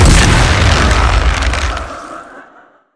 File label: bramble zone splash; Zyra